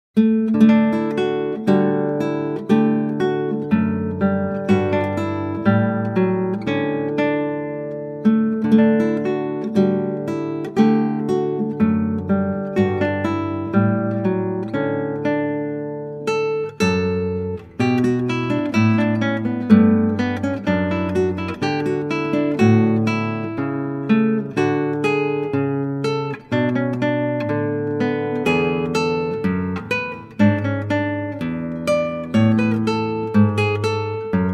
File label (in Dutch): Klassieke Gitaar; Bach 50 Solos